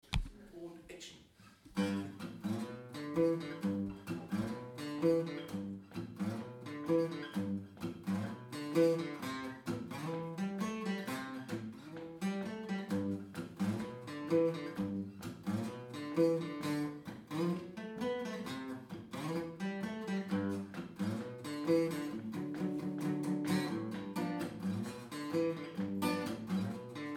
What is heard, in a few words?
rumba blues jam